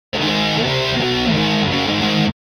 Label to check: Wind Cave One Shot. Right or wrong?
Wrong